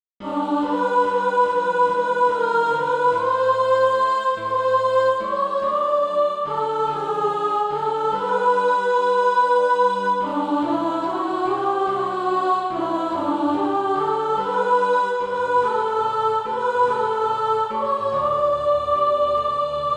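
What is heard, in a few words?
soprano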